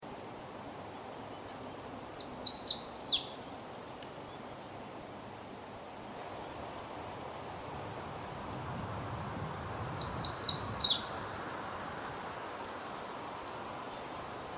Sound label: Magnolia Warbler